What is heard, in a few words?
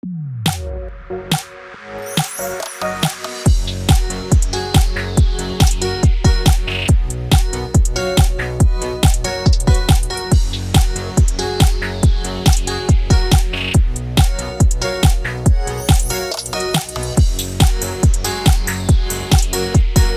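CHRISTMAS F# major 140BPM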